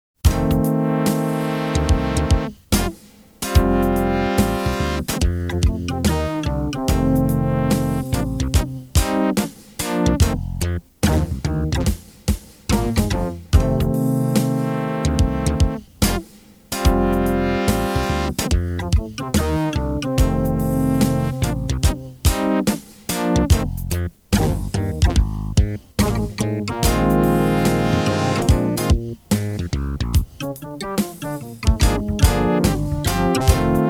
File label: Rainy Day Blues WITHOUT melody fade